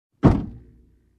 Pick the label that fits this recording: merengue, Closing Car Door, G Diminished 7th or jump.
Closing Car Door